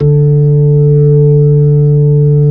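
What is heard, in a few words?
B-3, Hammond B-3 organ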